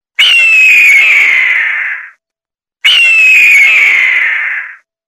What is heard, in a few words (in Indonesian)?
elang